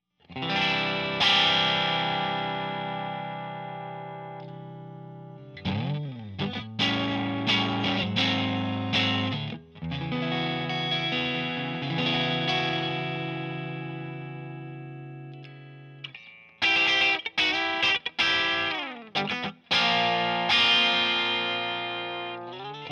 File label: MATCH CRUNCH